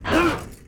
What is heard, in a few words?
war damage; Mob